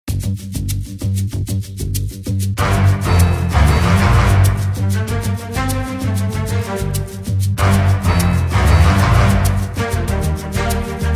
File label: Action Trailer 96bpm; MASTERTRACKS